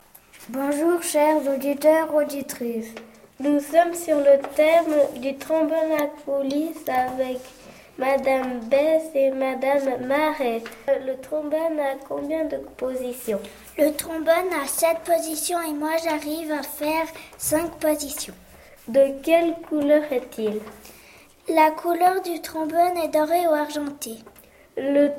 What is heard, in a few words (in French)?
interv trombone